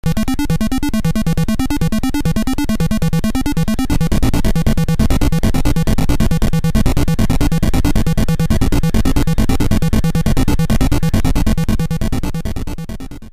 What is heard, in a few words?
Synthcart